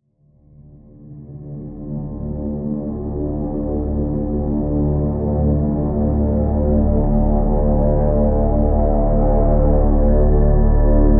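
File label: drone D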